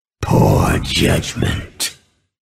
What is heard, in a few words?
dead, grunt sounds